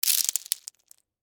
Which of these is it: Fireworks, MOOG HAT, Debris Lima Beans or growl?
Debris Lima Beans